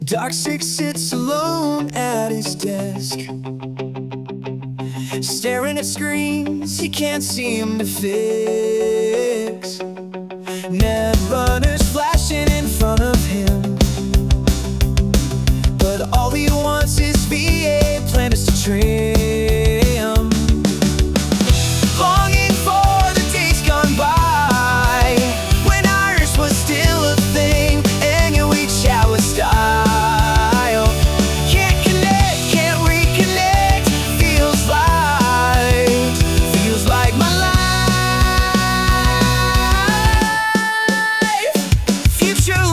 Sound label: Genrefluid Rock